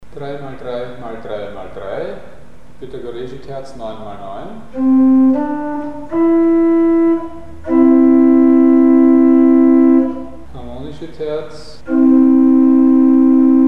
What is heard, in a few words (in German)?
Unvollkommene Konsonanz durch Frequenzmultiplikation mit Primzahl 3; Mikrobuch; bei 3x3x3x3